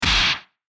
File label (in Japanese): 打撃音